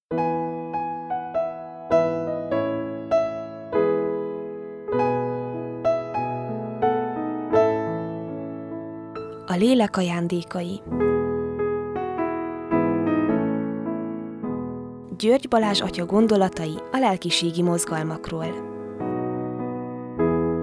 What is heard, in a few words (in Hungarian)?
jingel; A Lelek ajandekai